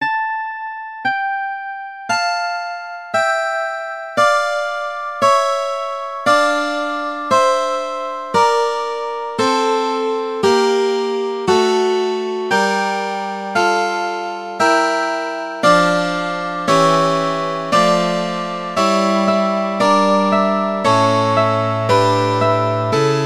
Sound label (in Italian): RE MINORE Largo partitura cello, mandolin